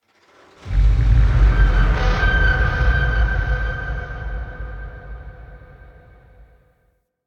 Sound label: Call Large, Ambience